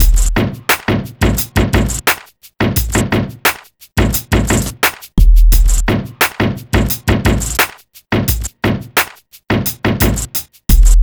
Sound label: E Coast HipHop Drums; East Coast; Digidesign; Pro Tools; Pro Tools Essential Loops